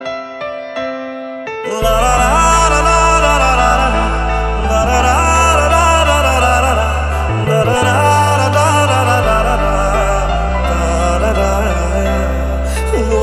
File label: Hindi Ringtones